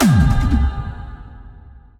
unleash charged; weapons; dota; marci

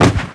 body impact medium

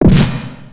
wall hit; spoonman; dota; crownfall

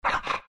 pant big, greevil; npc; courier

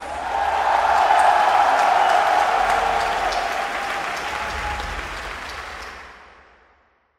cheer dire, spectator; dota

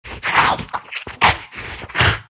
big lipsmack; Dota 2; Undying

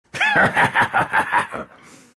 vipe laugh; dota; viper

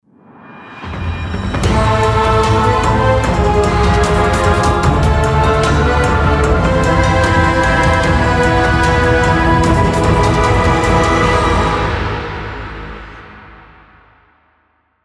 lose

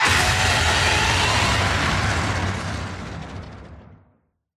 roar; ratholos; dota; mh event

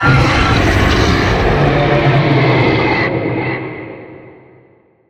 death seq; weapons; dota; creep; roshan

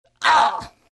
pain; rattletrap

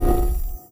rollover; ui; dota

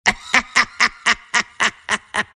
laugh; slark

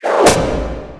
starfall impact; weapons; dota; mirana

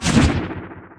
glaives attack; weapons; dota; silencer

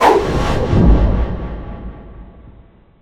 whip cast; weapons; dota; ringmaster

